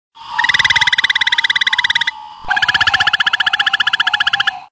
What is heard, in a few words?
hickman hybrid frog